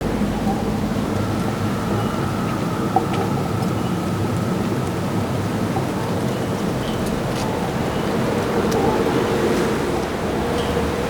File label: Amb Day Loop; Ambience; Mendicant Area